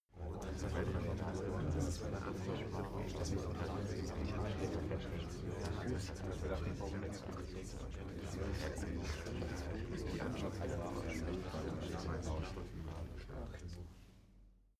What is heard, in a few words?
male crowd mumbling